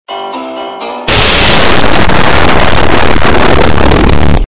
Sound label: piano nuke